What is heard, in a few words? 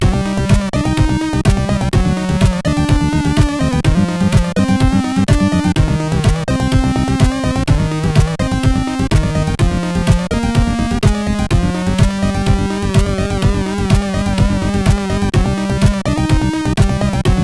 Tribal18, CSG8580R5